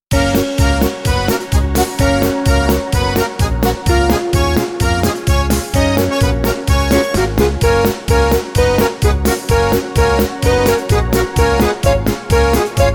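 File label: plus Gb major alto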